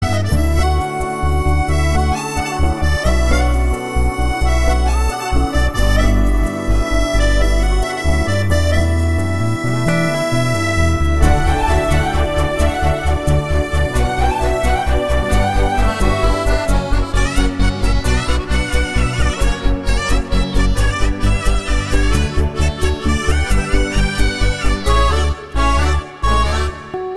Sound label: Love Bgm